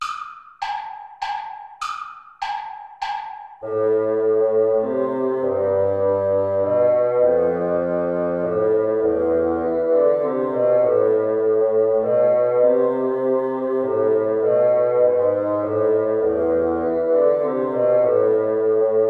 minuet play along

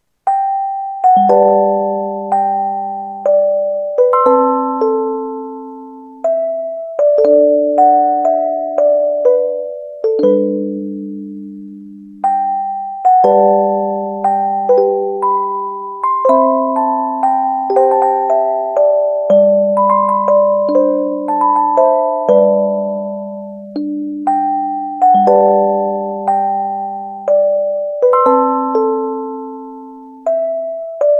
waltz lullaby for music box; the little goldfinch

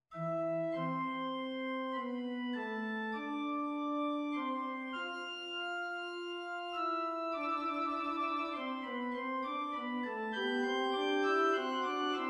fugue; harasimiuk piotr tomasz

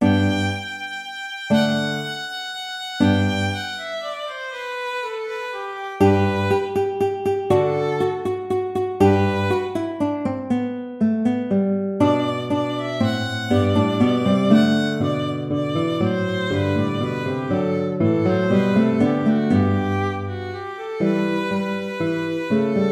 old hungarian dance

